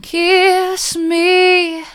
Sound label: kiss me mid, vocal